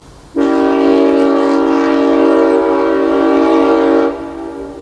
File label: horn